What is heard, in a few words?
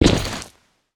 dirt landing; zaton; cop